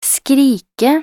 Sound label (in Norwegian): skrike